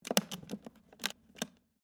Typewriter Case, Technology, Typewriter, Case, Open; Typing (Sound Effects:Offices); BlastWave